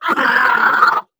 ballbot pain lg; player; Portal 2

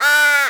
squawk call, crow; critters

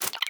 groom, creatures

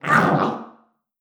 peel, headcrab; creatures; vox; Half Life Alyx; zoo

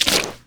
fs fm paint, footsteps; Portal 2

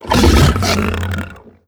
idle in, barnacle; Half Life Alyx

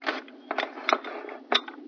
clank

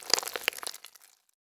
squeeze dry, gland; creatures; headcrab classic

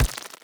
step layer, headcrab reviver, steps, creatures

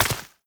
step, footsteps, player, mud

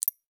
side menu click; ui; Counter Strike Model Pack; CS:GO; panorama

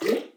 paint trickle; Portal 2

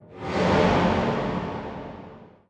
shake wet, strider, combat, damaged; creatures

